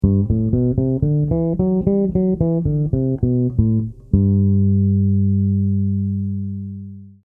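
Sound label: G major scale, bass; chords and double stops on bass; summer school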